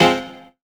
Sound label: Rave shot C